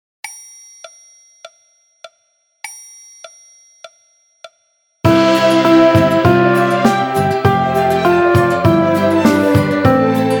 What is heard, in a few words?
Ode To Joy Piano Orch; ترانه شادی